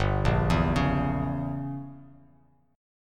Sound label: G Diminished 7th down Bass Standard; arpeggiated; JGuitar